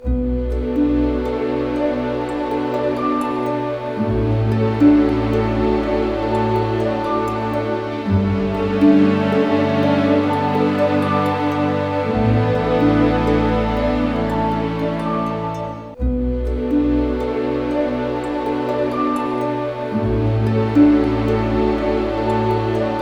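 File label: 60 sad, orchestra; East West; Scoring Tools; Sad Story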